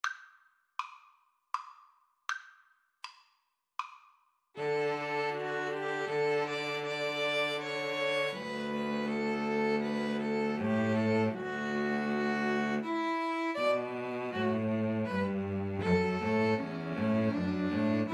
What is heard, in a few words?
Piano Trio